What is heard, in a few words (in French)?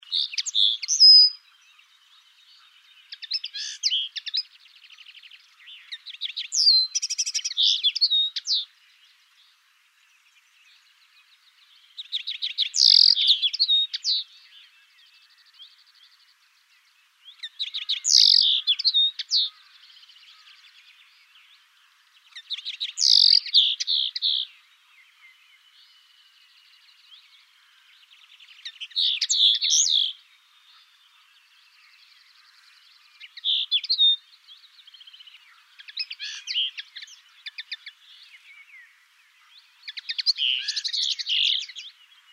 Linotte melodieuse